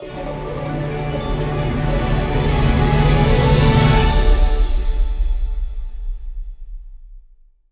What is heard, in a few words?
near Win; fairyred